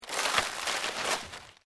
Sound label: LEAVES DEAD MOVE, Rustling; Objects